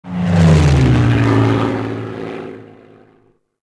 Fighter Command Patrol; Civ4 warlords

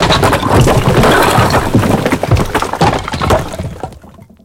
building fall mid; tanks; War Thunder

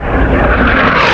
Artillery; Red Orchestra Ostfront 41-45

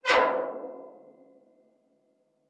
ambient battle; World Of Warships

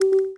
receive squad request; World of Tanks Blitz

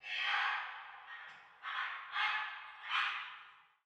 tropics birds; ambient; War Thunder

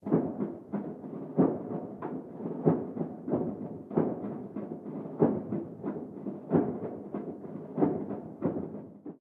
ambient port; World Of Warships; port3d

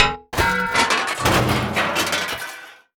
combat atmosphere; World of Tanks

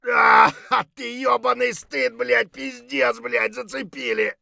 bear3 hurt heavy; Escape from Tarkov

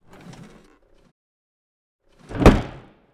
Aircrafts L-39 Canopy Close; DCS

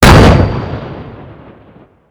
Vehicle Weapons; Red Orchestra Ostfront 41-45

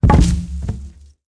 pole falling; World of Tanks Blitz

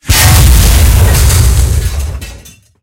tank hit heat mid; tanks; War Thunder

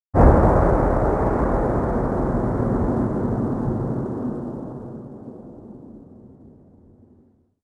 expl far tail, explosion; World of Tanks Blitz

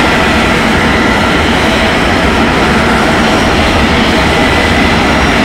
me163 on low, engines; War Thunder

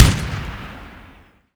imperial carbine 1shot

